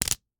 Slap bracelet, smack, slap, whip, felt, plastic, hit, roll up; Eiravaein Works; Vaeyan IV